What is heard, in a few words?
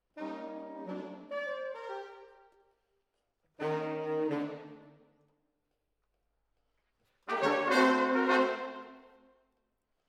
Horns Over